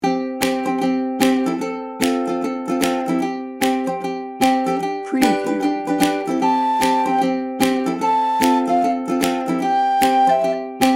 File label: ukulele kids no vocals; cheery